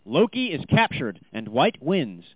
White Victory; Ragnarok

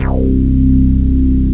RES BASS; Widget Workshop; A Mad Scientist's Laboratory (1996); Maxis

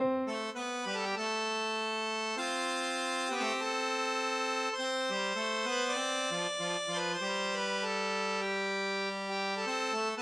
ballad

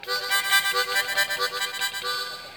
Harmonica ditty intro

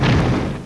Enemy Tank Explosion; Tank Wars 2.0

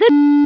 Q Beep; Foul Play

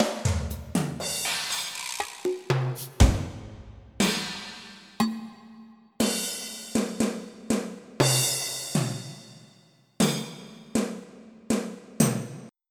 Room; Onj's Soundfont

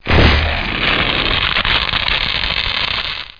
stoned, medusa; Hexen II

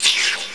Zap; Curse of Dragor

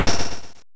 sword break

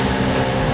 ELEVATOR

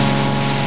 Engine noise

